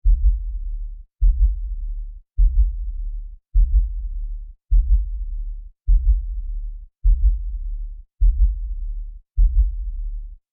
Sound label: Heartbeat Horror Low Frequency, Heartbeat Low Frequency Rumble; Human; Ominous; Heartbeats